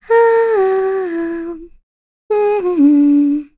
Vox Hum; Yuria; kings raid; en

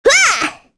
vox Jump, kr; Sonia; Kings Raid

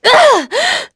Damage; Veronica; kingsraid

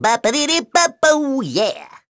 Vox Hum; en; voices; heroes; Neraxis; kings raid

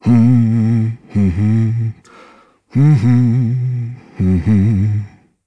Vox Hum, jp; Dakaris; kingsraid

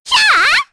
Vox Attack, kr; Hanus; Kings Raid

